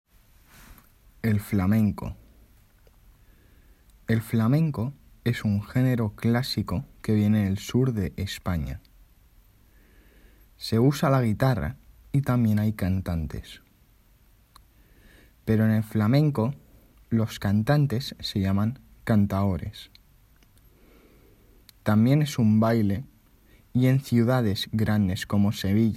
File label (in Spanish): El flamenco; Forlaget Plenum